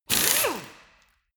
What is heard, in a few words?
impact wrench screw single short; industrie wirtschaft; handwerk